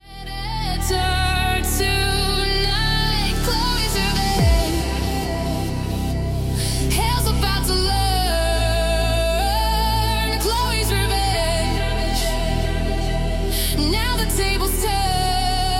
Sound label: hardstyle remix